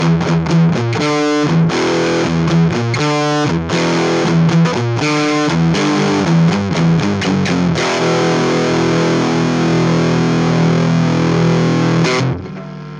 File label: rocktile gain full